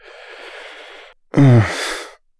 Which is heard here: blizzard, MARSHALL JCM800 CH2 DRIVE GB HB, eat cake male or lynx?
eat cake male